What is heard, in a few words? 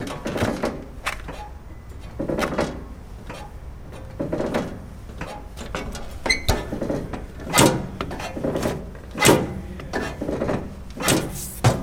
Forklift Gas Pedal